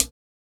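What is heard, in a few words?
HH, hi-hat; X-Static Goldmine 1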